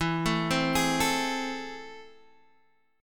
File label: A Minor Major 7th, E